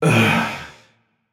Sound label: ugh, voicepack; smite; jorm